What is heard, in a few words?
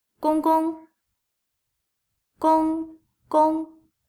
gonggong